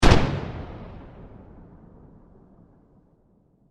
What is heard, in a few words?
Warfare Cannon Fire Single Shot Blast Explosion